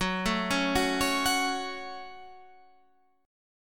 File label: F sharp Minor F sharp, Guitar Standard; arpeggiated; JGuitar